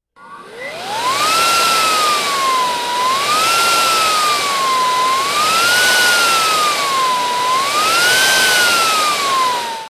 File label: Electric Turbine, power on off